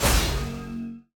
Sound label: Hero shield Activate; Folk Hero